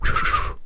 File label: fwfwfwfwfw